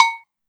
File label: Bell, Yamaha DD-20